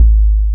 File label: kick 808; E-MU Formula 4000 Series Vol. 3; Analog Odyssey; VK+ Instruments